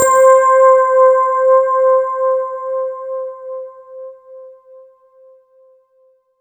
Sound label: C4, ANALOG SEQ; Roland JV 2080